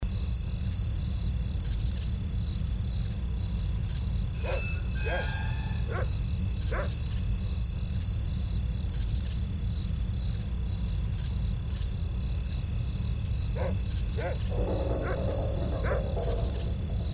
ambient sound city night; baldursgate

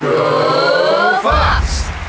fox crowd; Super Smash Bros. Melee

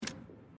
menu anklicken; life is strange

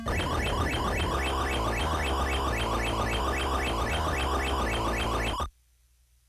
level game over sound; gamesoundopedia

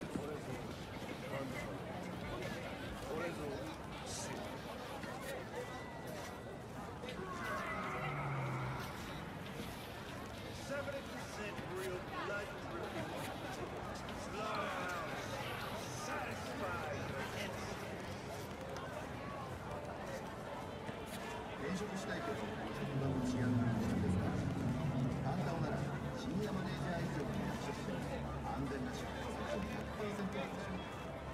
audio sample walking through city centre; Cyberpunk 2077

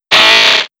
ninjask cry; pokemon x y

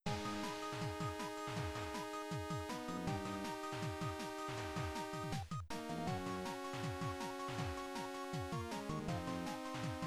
music; cave story

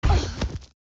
die; kena bridge of spirits

